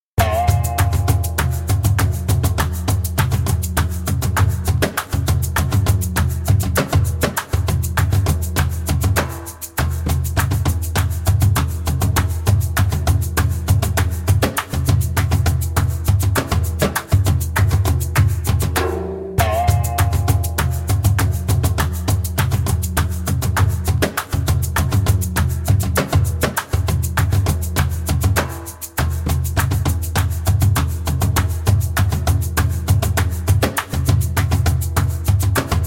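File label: Saidi fast spoken, djembe; Innemee's Saidi; Djembolale - Grooves and Moves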